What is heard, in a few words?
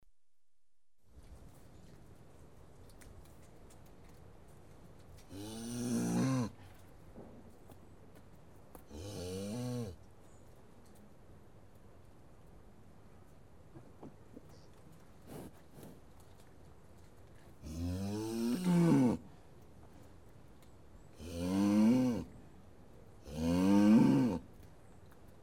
Cows Mooing, Livestock; BBC Sound Effects Library